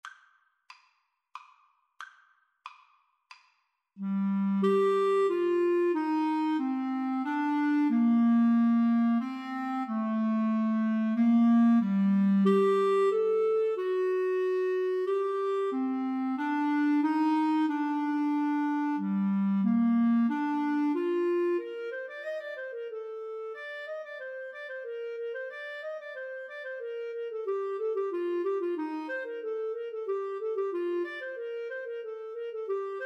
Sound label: rondeau, Flute-Clarinet Duet